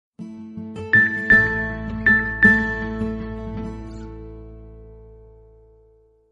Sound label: piano sms